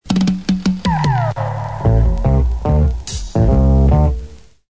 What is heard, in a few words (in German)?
Werbesounder; radio w1